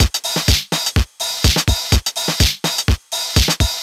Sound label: drum twosna full 125, Drum Loops, Classic Chicago House